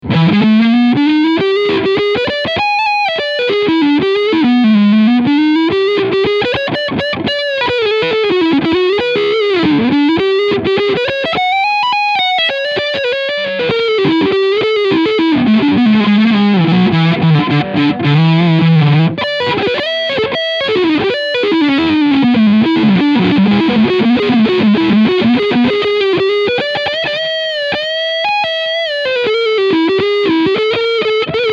Cort G 290 LE Leadsound Front Humbucker

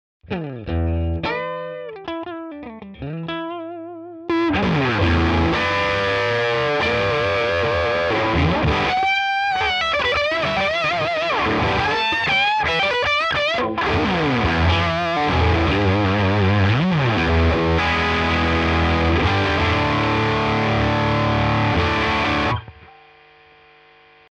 Walrus Fundamental DISTORTION LED Lead

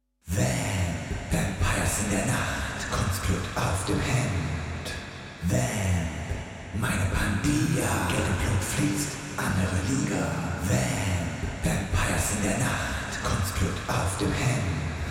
unnatural Decay 7 Diffusion 29; Tverb; VMPires

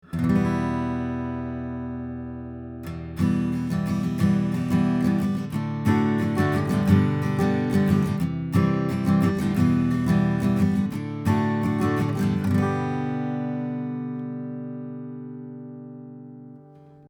414 Omni Strumming

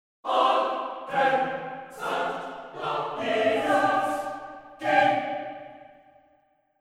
stacc

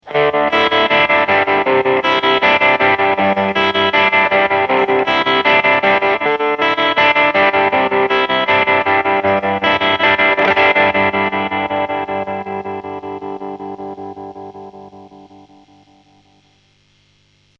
Tremolo; FDR

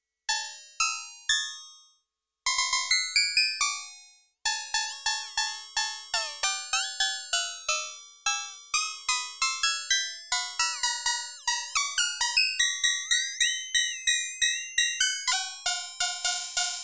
Perc

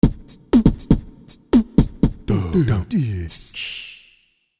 VP550 Human

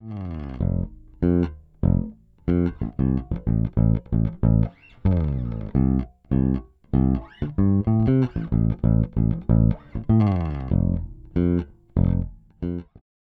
DI Solid; Zoom B2 Four

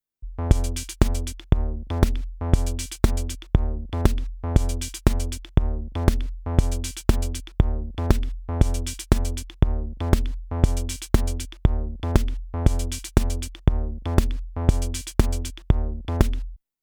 Rupert Neve Designs Portico 5017 DI comp

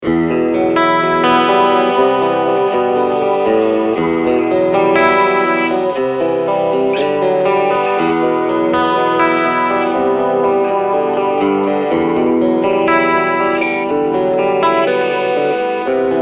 Chorus

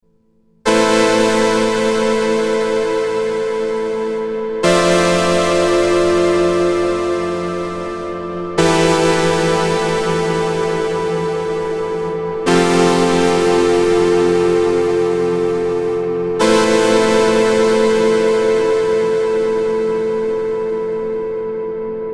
LXP-1 Juno 60 Chords LARGE

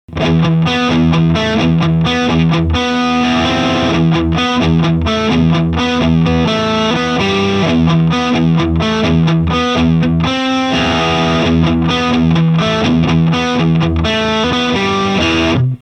Modded; Boss IR-2

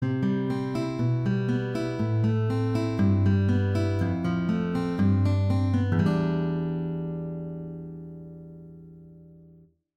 Acoustic

